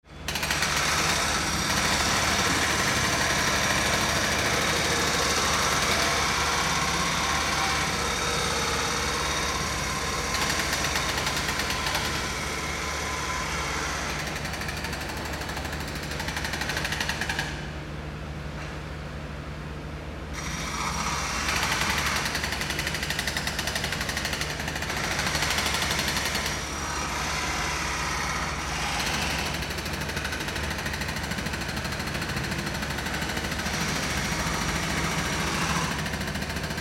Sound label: roadworks